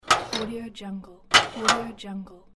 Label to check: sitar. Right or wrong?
Wrong